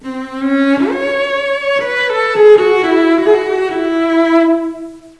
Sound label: glissando, cello